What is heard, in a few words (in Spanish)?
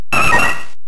Grito de Swellow